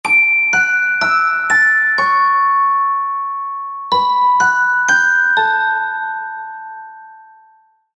Combination Ringtone

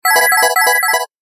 Serene tone; Samsung GT-S6888

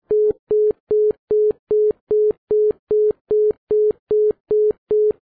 congest; Samsung SGH-i620

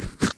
thumb keyboard launch; Nokia; 770 Internet Tablet

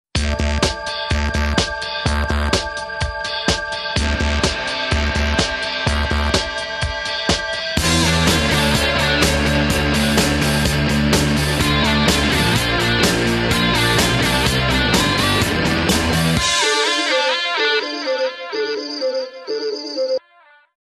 cycles

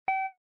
camera focus fail; Asus Padfone-2

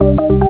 Ring, Cisco Unified IP Phone 7945G

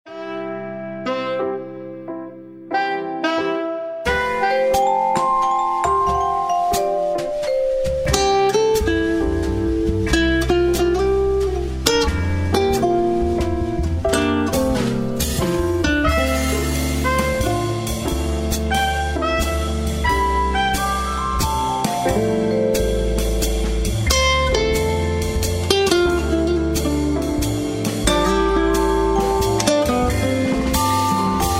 Jazz, alarms; Tecno; Pop 9 4G

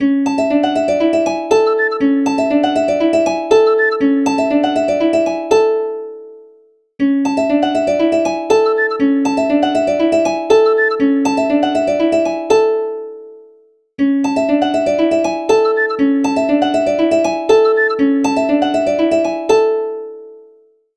Pleasant Celtic Harp, ringtones; LG Wing 5G SKT

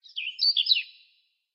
Oriole, notifications; Gionee S10

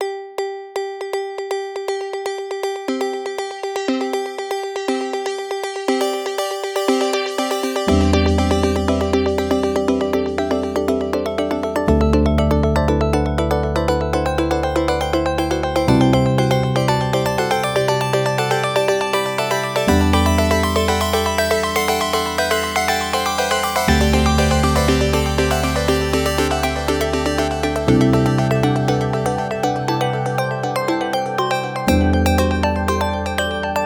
Echo, alarms; Google; Google Sounds; Gems

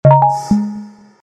Shutter Sound, Event sounds; LG KF900 Prada II

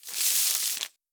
Papers; Vestel; Venus

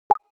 Popup; Samsung SGH-i997 Infuse 4G

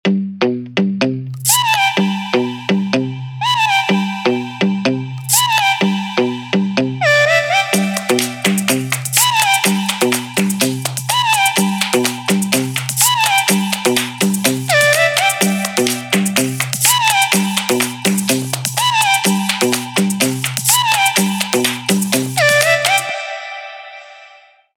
Pleasant Snorkeling; LG K92 5G; ringtones

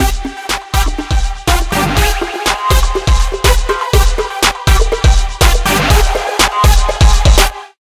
music hiphop, ringtones; Sony Ericsson; A8i; cmcc

